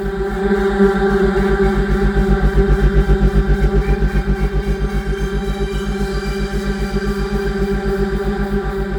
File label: Music Loop